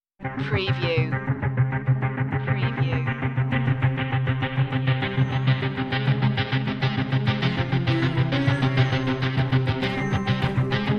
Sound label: eastern tension no dubstep